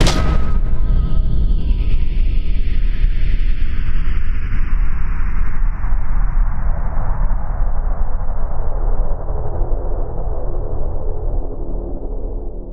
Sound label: something stuck in there, Cinematic Metal Impacts